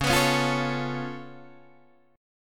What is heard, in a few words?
C sharp Augmented 7th C sharp down Guitar Open D, chord